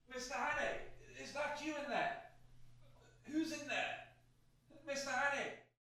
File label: Paddock shout; the 39 steps